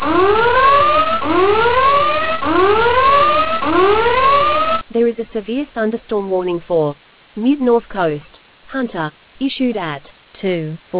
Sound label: storm audio